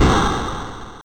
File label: explode; tileworld; boulder dash